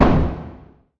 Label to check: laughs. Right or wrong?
Wrong